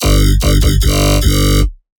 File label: Melodic Bass G 150, One-Shots Bass Hits; Ghosthack; Color Bass Essentials